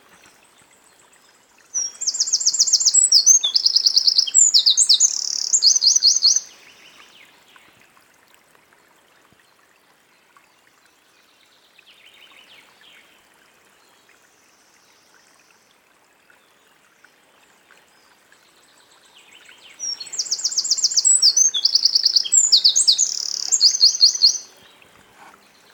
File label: eurasian wren troglodytes troglodytes